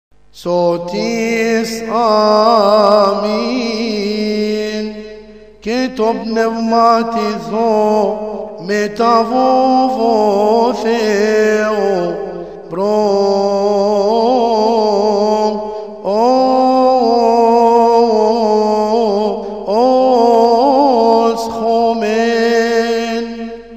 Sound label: Amen; Sotis Amen; Hymns; St Basil Liturgy